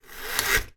household blind fabric drop; zapsplat

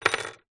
key mortice drop down wood table

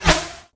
medieval scythian recurve arrow heavy pass by; still north media

